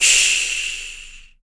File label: CYMBAL, MALE PERC